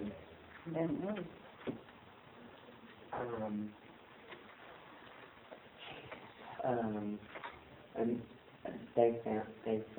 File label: Unexplained whisper; Jesus